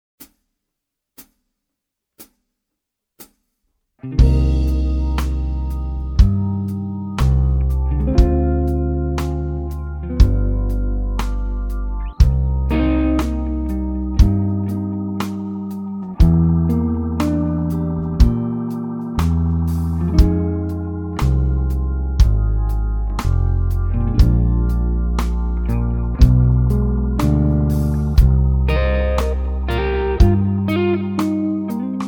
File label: Bass therapy